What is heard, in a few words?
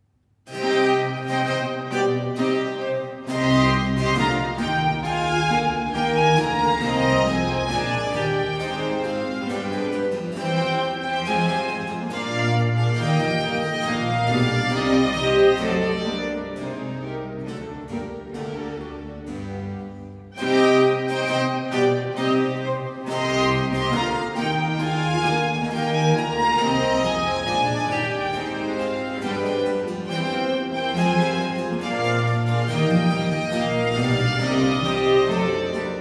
Sinfonia 2. Allegro; Arcangelo Corelli; Corelli - Complete Works; Klassika